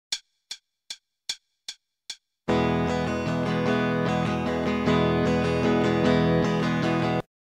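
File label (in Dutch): Ritme, gitaarstarter; Meespeel CD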